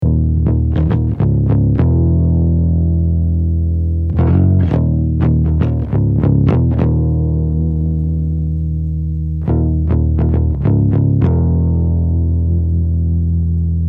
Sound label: bass OUT